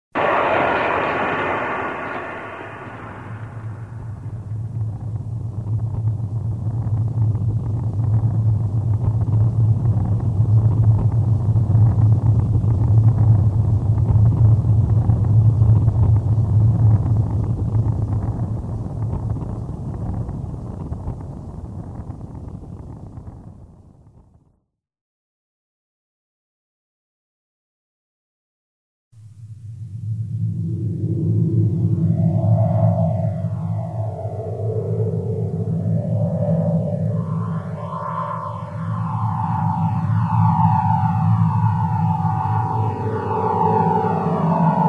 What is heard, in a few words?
synthesizer; SOUND EFFECTS1